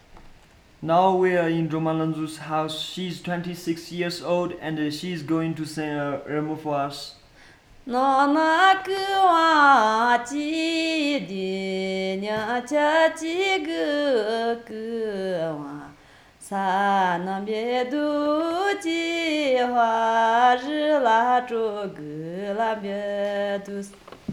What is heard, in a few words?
Nyi shar Folk Song